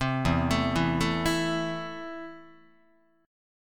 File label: E Suspended 4th Sharp 5th, Guitar, Esus4#5 chord; arpeggiated; JGuitar